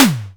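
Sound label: snare drum, Simmons SDS8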